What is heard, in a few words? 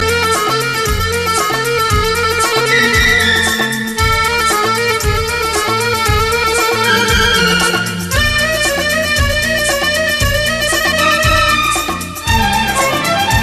Old Instru